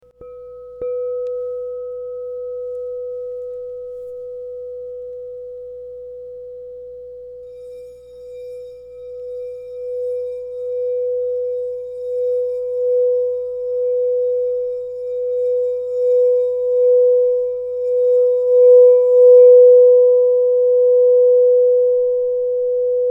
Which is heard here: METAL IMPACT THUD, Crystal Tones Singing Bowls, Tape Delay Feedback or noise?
Crystal Tones Singing Bowls